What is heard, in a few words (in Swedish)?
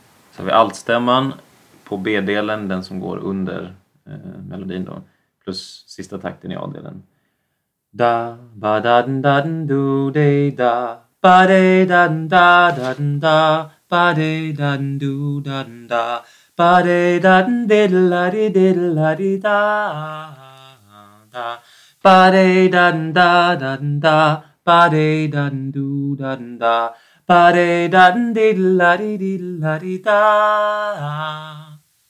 Alt B